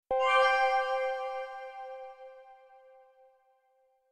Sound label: Fountainrecover Cue Cm